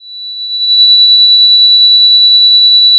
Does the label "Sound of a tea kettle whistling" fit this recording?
Yes